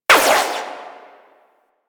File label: Shot, Double Barrel Shotgun; Warframe; Tenno; Tigris Prime